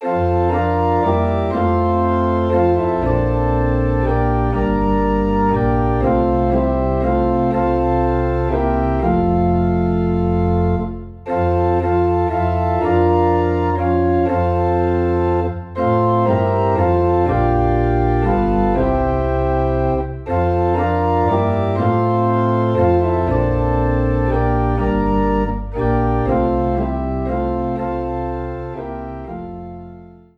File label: Organ in F; Breathe On Me Breath of God